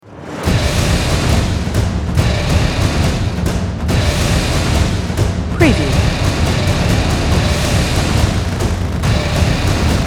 Cinematic Epic Battle Drums Action Trailer Teaser Exciting